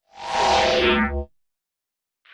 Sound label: drone slide beam